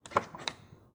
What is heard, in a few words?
Viper GTS Door Open; Automobiles